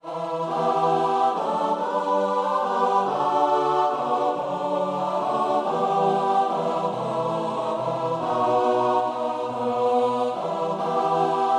what child CHOIR

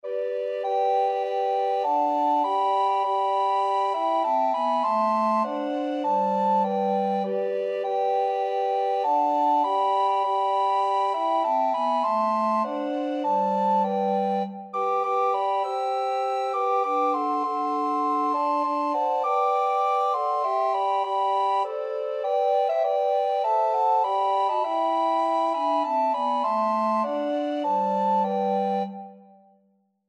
christmas tree STRING quartet

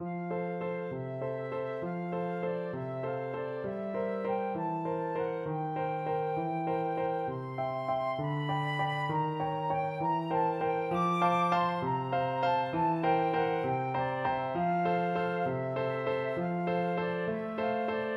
flute waltz

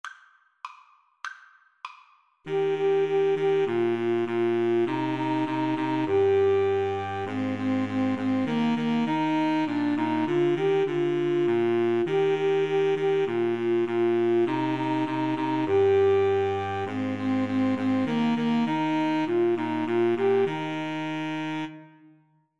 SAX, Woodwind Trio; old st nic

